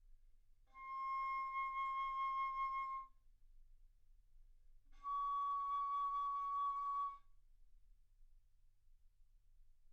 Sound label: Bass Flute ff C6 Db6